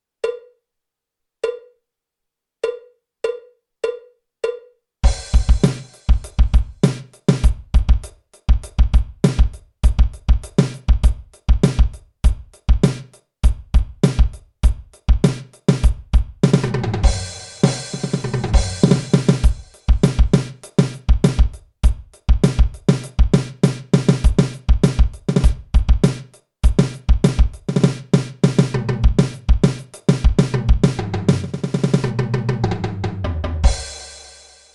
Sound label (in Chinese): 架子鼓独奏 爵士融合; 66条架子鼓独奏SOLO